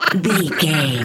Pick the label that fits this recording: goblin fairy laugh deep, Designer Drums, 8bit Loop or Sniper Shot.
goblin fairy laugh deep